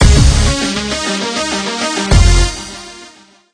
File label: Stinger Quest Complete; android